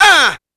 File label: Uuh; Roland CR-80